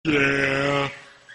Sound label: Yeah Lamb; Animals